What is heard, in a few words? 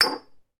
foley 2 beer bottles bash into each other on worktop